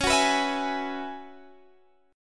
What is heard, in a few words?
F sharp 7th Suspended 2nd Suspended 4th